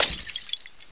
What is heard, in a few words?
shatter